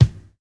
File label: 80s thick kick drum sample a sharp key, Royality free bass drum one shot